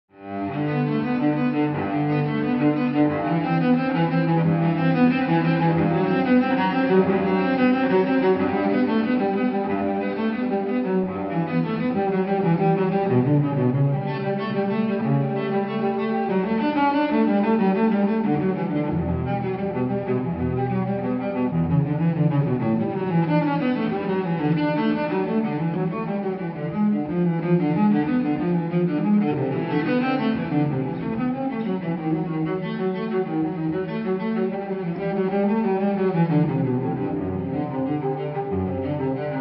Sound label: prelude from bach cello suite no1 bwv 1007; rostropovich